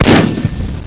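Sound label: cannon; shock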